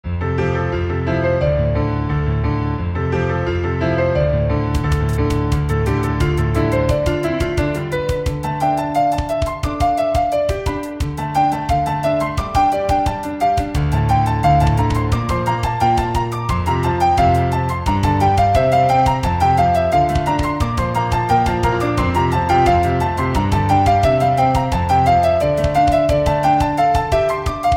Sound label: piano